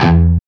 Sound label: CHUG E 1 R, Guitar; East West; Steve Stevens Guitar Samples Collection